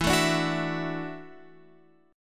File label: E 7th Suspended 2nd Suspended 4th Guitar Open F; E7sus2sus4; strummed; x,11,9,9,9,11